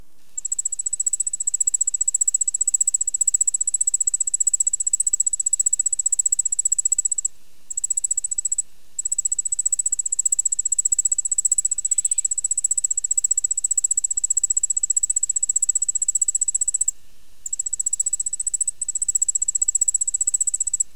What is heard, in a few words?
Allards ground cricket; Insects